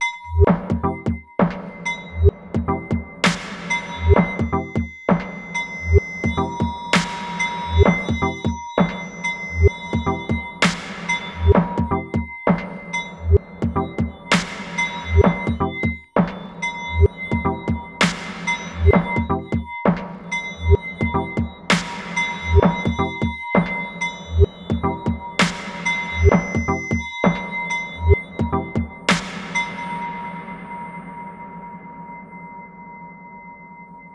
1010music BitBox Micro Modulation Grain Speed Parameter